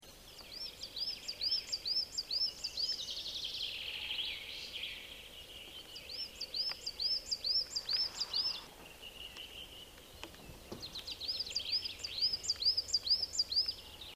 Periparus ater

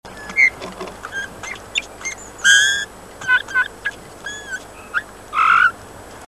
Pyrrhula pyrrhula

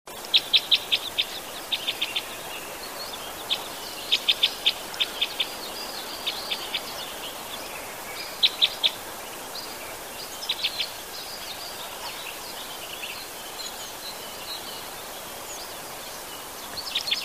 Loxia curvirostra

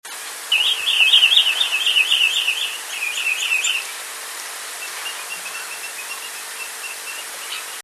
Tringa glareola

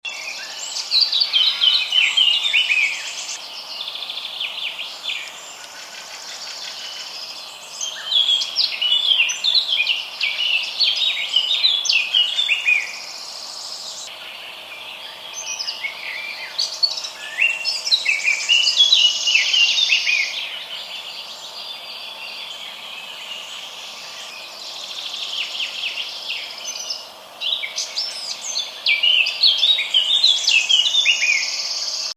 Sylvia atricapilla

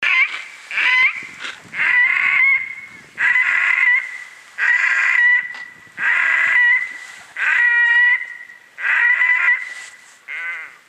Podiceps grisegena

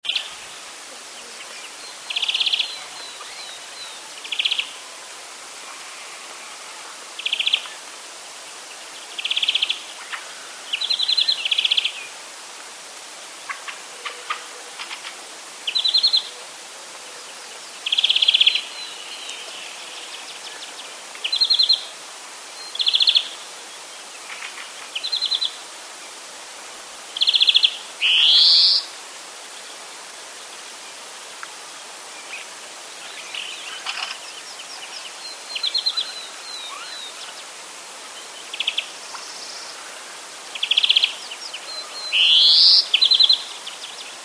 Chloris chloris

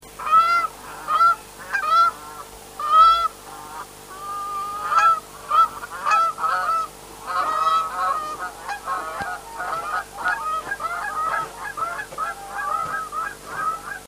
Branta canadensis

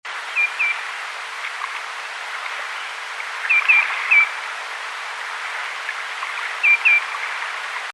Tringa nebularia

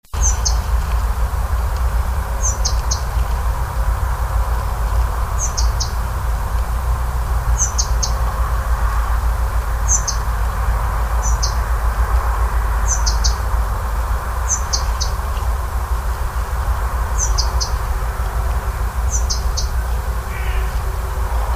Muscicapa striata

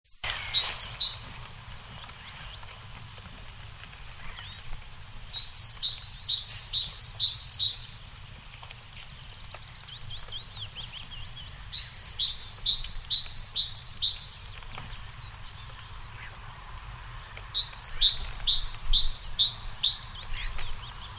Parus major

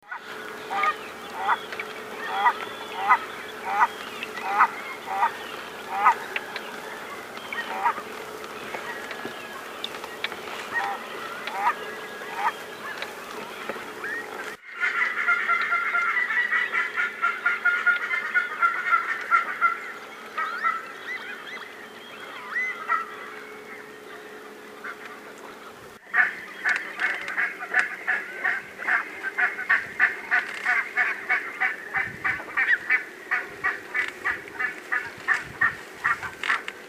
Branta leucopsis

